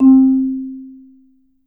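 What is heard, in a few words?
Marimba, soft bass, C3; Sampleheads - Dave Samuels Marimba & Vibes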